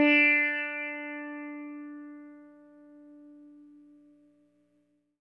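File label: electric sitar soft; Quantum Leap - Guitar & Bass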